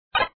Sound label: Power; POKEMON